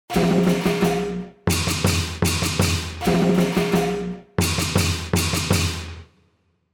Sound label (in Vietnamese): chieng